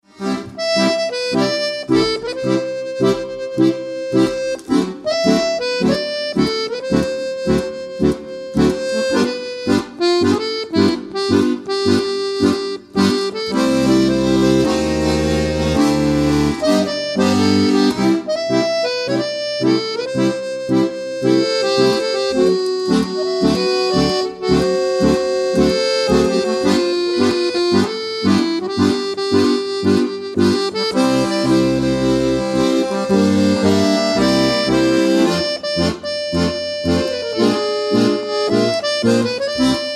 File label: accordion duo